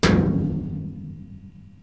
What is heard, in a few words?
Metal Cabinet Hit